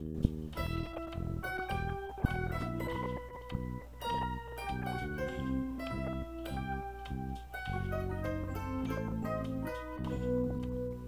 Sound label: mando bass piano